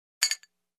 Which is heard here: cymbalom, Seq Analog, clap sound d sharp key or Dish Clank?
Dish Clank